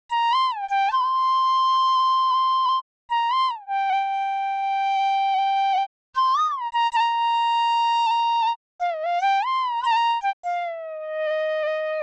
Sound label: bansuri, hindol